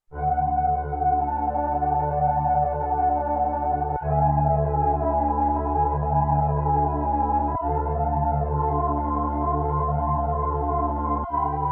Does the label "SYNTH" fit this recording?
Yes